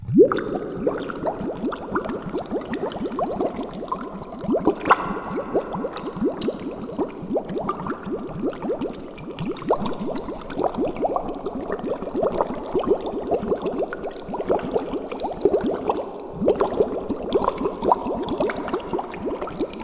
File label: water land